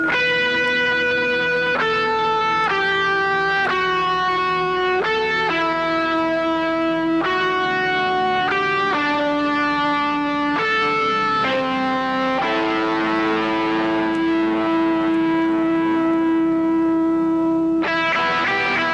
Guitar; The Crow

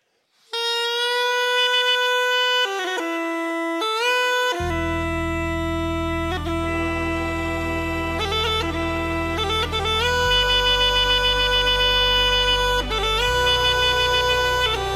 Bg Pipe

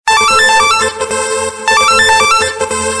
Buzzer Sms

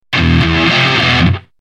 Electric Guitar

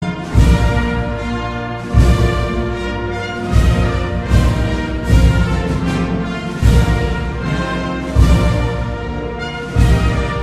Anthem Of Spain

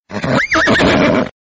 Horse Neigh Sms

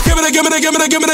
Beats Knockin

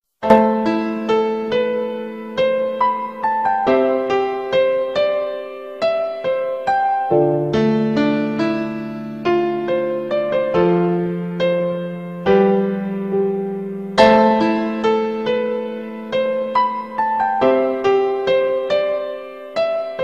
Paino Music

